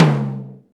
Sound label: DM5 Tom Hi, Alesis DM5 drum machine